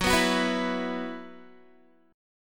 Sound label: Gb 7th Suspended 4th Gb down Guitar, chord